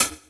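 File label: Hat Open Electro; Drum Hits; Nu Dance Electro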